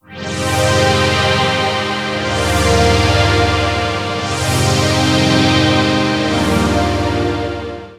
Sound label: Grand String; Sonic Foundry (Sony Creative Software); The Electronic Music Manuscript Collection